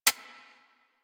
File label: Decoy Counter Measure Mech